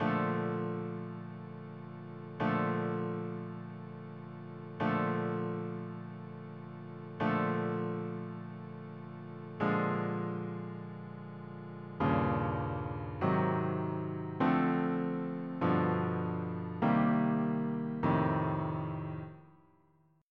rhythm changes Bbmaj7

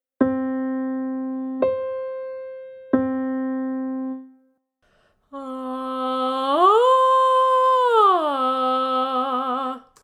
Vocal Exercise

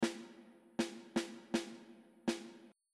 Rhythm Ex

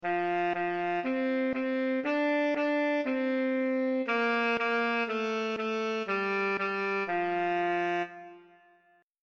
Twinkle Twinkle Sax

